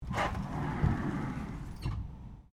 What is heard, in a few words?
Door Slide Industrial Freezer Open Stereo, Industrial freezer sliding door